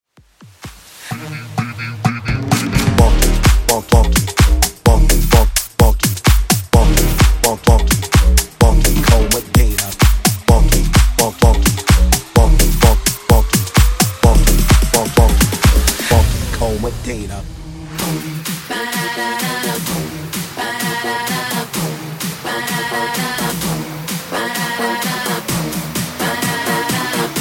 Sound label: Funk 128Bpm